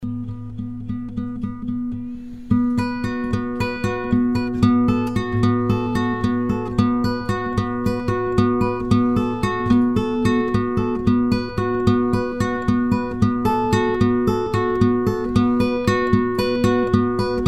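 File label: Acoustic Guitar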